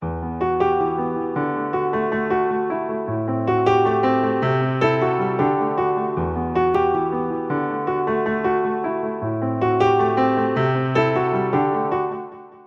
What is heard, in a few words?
Soul Piano